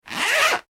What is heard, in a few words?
zipper; foley; Zippers; Objects